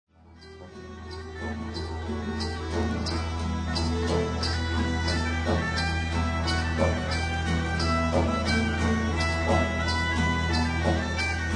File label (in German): Geigen Branle